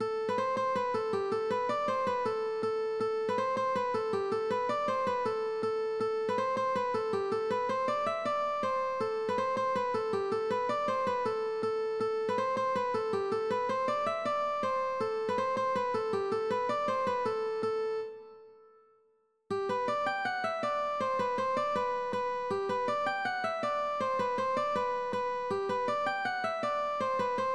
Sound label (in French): Gavotte